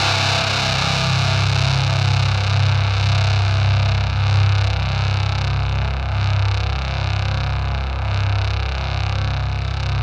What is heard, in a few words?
ZWEEP, Synthy Tonal; Distored Reality